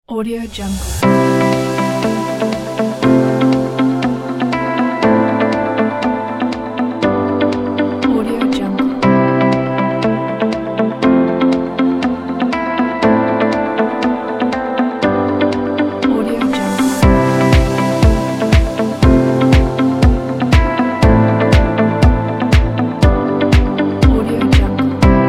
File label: corporate spring uplifting